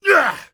bear2 hit; Escape from Tarkov

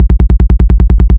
loop l8a1 bass; guns tanks; War Thunder

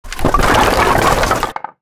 objects, World of Tanks

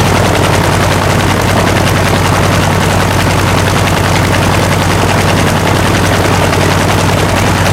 zero side, engines; War Thunder

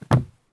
jump wood; Escape from Tarkov

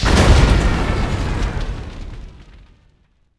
Plane Die; Civ4 warlords

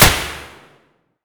mosin indoor silenced close; Escape from Tarkov

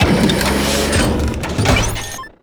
relay unfold

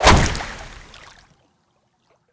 water hit, damage; DCS

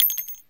9mm shell concrete; Escape from Tarkov

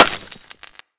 light bulb break; Escape from Tarkov

